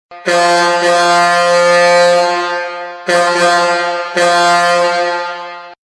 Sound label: Truck Horn